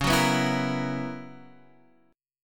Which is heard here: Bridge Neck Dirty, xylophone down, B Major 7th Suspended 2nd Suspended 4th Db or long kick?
B Major 7th Suspended 2nd Suspended 4th Db